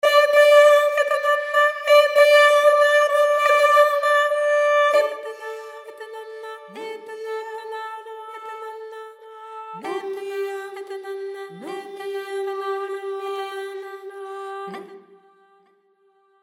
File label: Vocalise Rhythmic Pedals Stacked